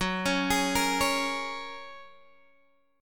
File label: Gb Major Add 9th, down, Guitar, Open G